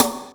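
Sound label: Digi S, Boss DR660 snare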